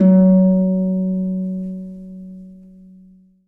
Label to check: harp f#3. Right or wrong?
Right